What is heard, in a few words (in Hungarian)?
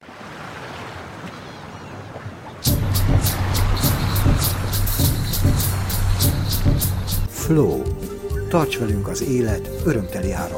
Flow szignal